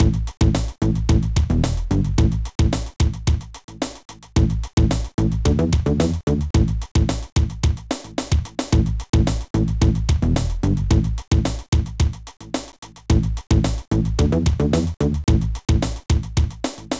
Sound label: tune; Video Strip Poker: Red Light Edition